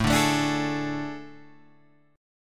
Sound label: A Major 7th Suspended 4th, Guitar, Open G